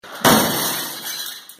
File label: window glass breaking